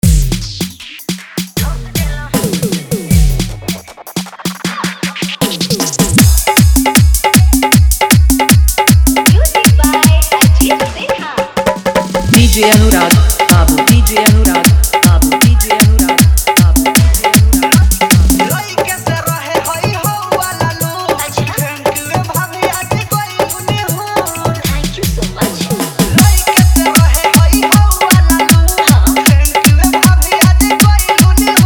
Bhojpuri Dhollki Bass Mix

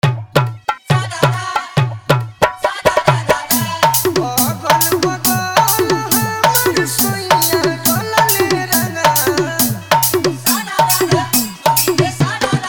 Holi Bhojpuri Dj Song Hard Bass Mix

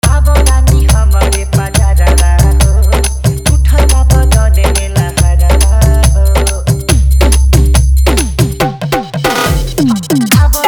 Bhojpuri Dj Song Hard

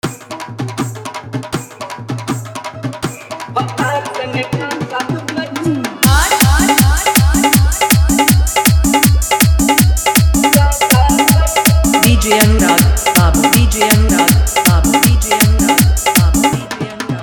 Fully Dhollki Bass Dance Mix

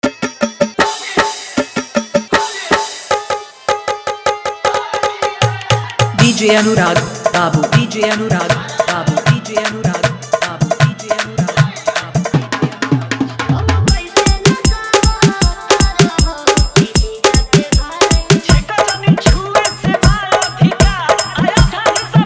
Holi Hard Dhollki Bass Mix

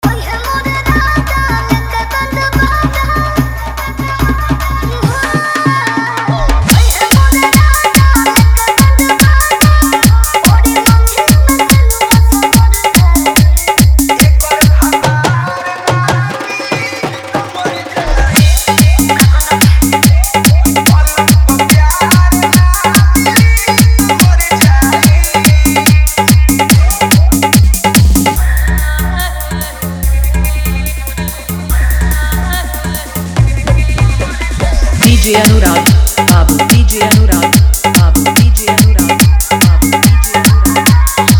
Bhojpuri Dhollki Bass Mix

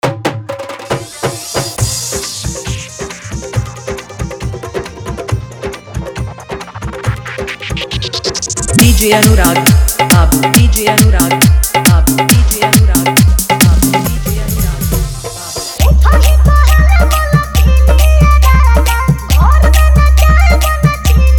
Hard Dhollki Bass Remix

